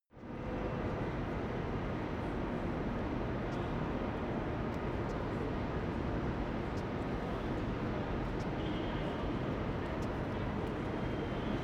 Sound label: train main station